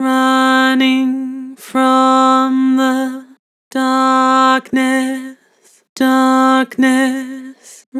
Vibrato Running from the darkness, Harmor Vocals